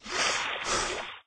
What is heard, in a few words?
drowning gasp; server-nalc; ambience modpack